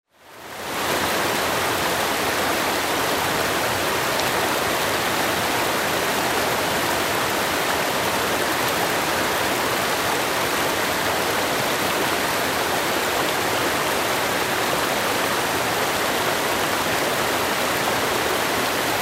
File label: Mountain stream flowing; river